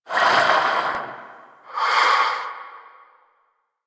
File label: Vocal Breathe; Warframe; Duviri; Dax; Vocal Set A